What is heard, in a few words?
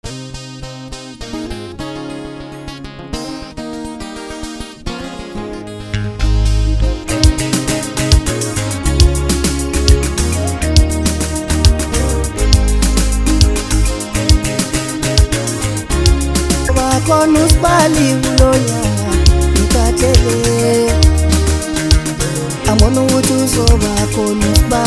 Maskandi Song; maskandi music